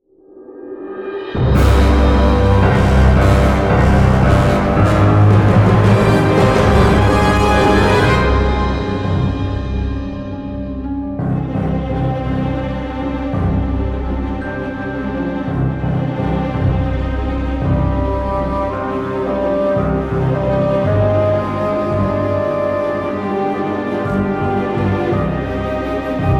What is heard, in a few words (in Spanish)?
orquestal accion terror